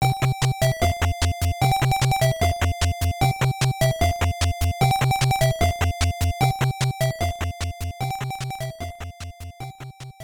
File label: Power Up Room; Xexyz; NES; Area 9-11 Info Room